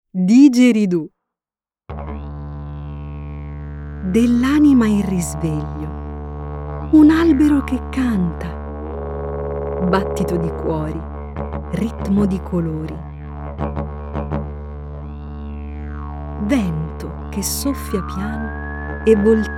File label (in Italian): Didgeridoo